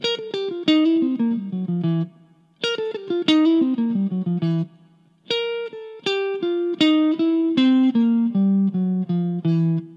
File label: Mixo Lick